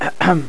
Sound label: Beep Ahem; KDE